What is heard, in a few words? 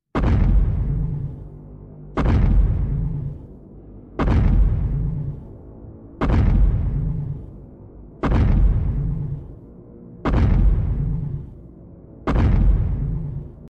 Walking Giant